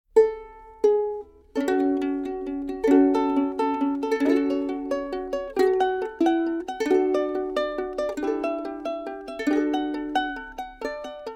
ukulele

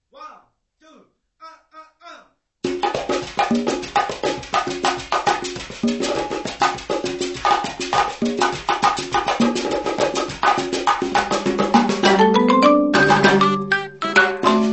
Marimba Tropicana Marimba Tropicana

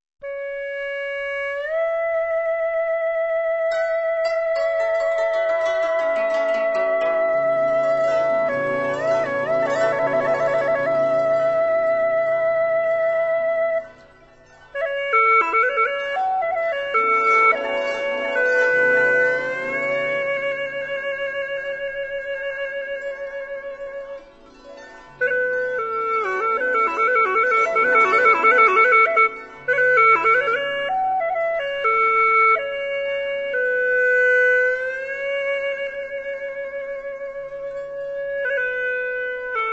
Pipes Minority; World Sounds

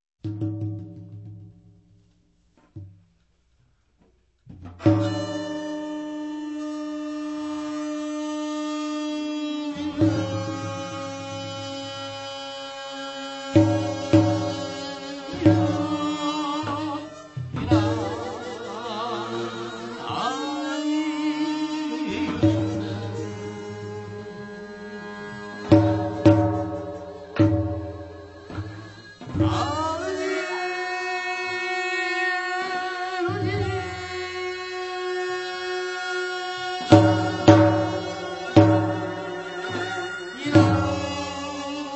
Shamanistic Chindo; World Sounds

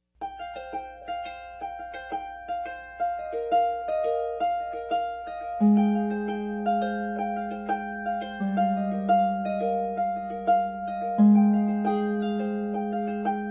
Harp

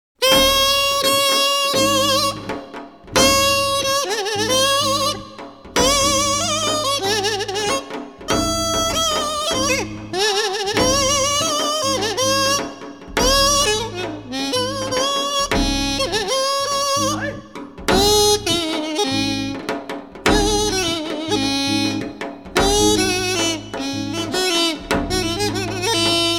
guqin

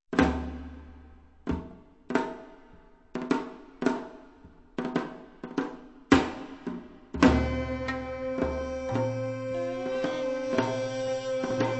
Folkloric Traditions; World Sounds

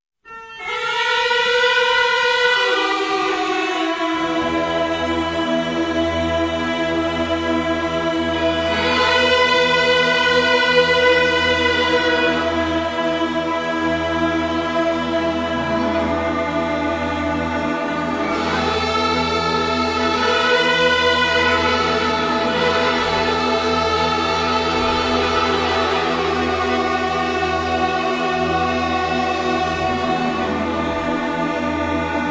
Gaida Orchestra; World Sounds

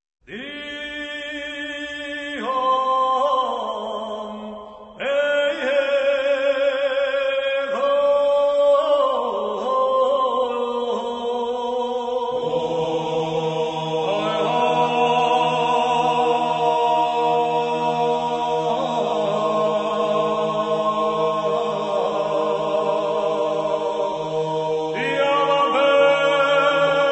Georgian Polyphony; World Sounds

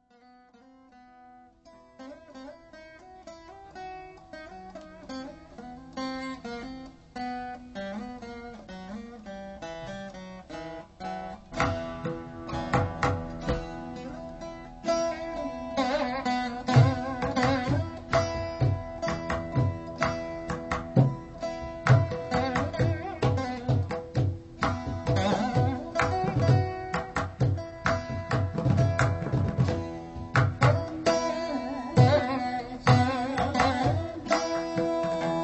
Veena; World Sounds

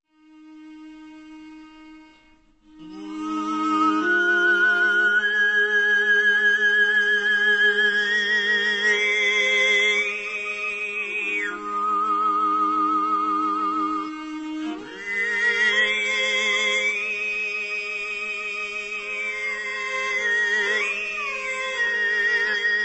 Folk Traditions; World Sounds

